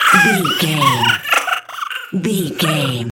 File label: goblin fairy death intense